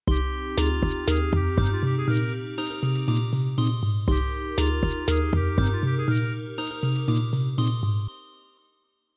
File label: garden waltz